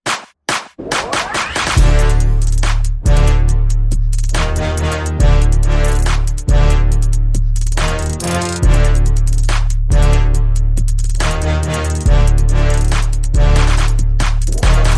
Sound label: No Vocal